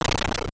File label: sound effects break brick block; super mario bros 3 nes